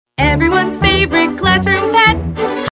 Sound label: Jingle